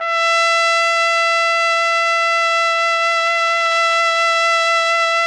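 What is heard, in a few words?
TPT, trumpet; Roland - LA Composer vol. 3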